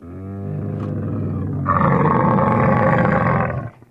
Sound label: Camel Groan Single; camels